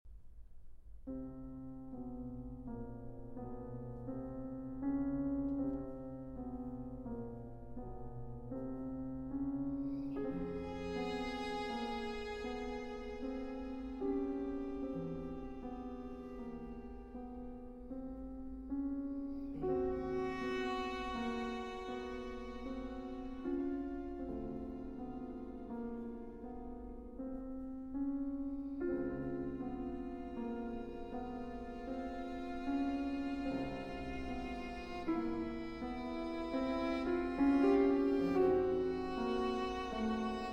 Concerto for Piano, Violin and String Quartet in D Major, Grave; Chausson; Op. 21 III.